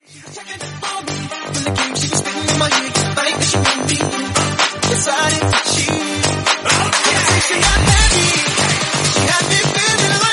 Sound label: Tech House 128bpm Gm